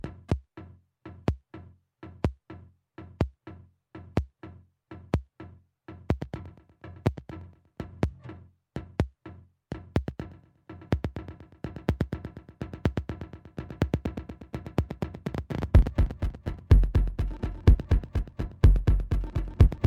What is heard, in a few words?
radius efx echo